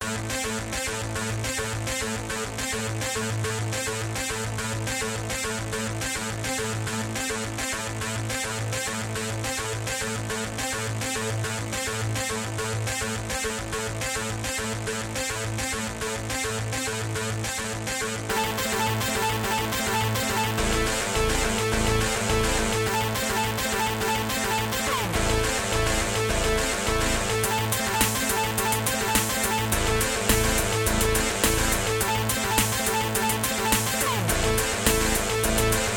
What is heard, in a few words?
wailing synth solo